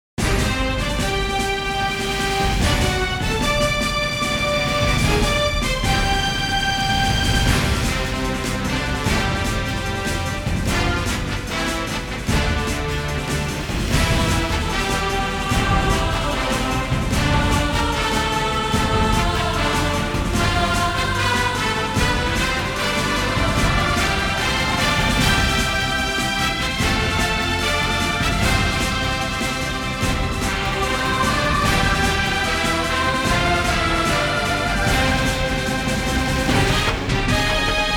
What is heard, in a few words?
Theme Of China Gate Instrumental; China Gate (1998)